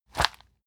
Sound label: plastic bag hit hard